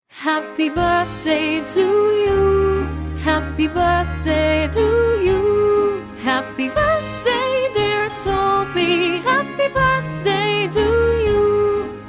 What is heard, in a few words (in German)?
happy birthday tobias